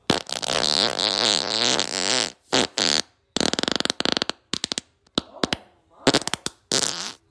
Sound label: farts